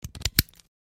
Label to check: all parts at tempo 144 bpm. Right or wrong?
Wrong